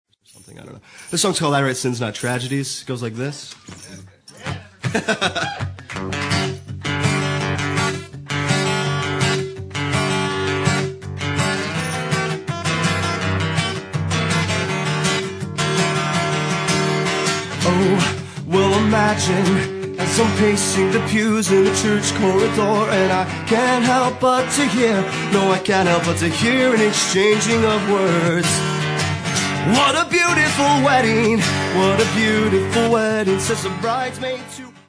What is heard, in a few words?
acoustic